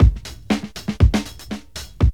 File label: break, breakbeats